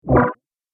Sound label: Multimedia Rollover, mouseover sound